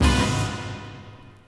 STAB

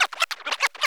SCRAT; E-Lab; X Static Goldmine 2; akai

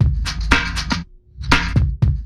LOOP, DRUM; E-Lab; X Static Goldmine 2

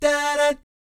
DAH DAT, Jazz

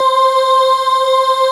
VOICE PAD, ATMO PADS; Best Service; XX Large

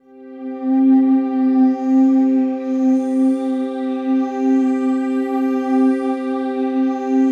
pad, atmo pads; Best Service; XX Large

